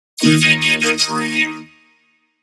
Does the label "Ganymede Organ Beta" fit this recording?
No